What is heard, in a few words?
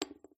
chip put down table